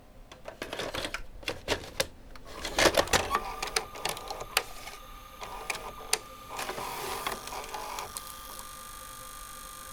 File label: VCR Tape Insert (Lid Opened); SFX; IRL Recorded